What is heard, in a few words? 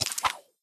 match sequence multiple; Candy Crush Friends